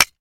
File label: Mouse Trap Snap Wood